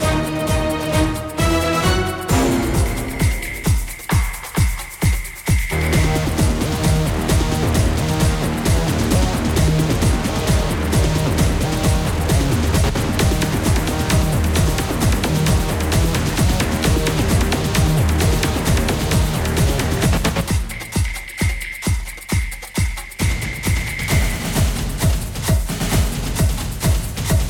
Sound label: Music Loop; Choplifter HD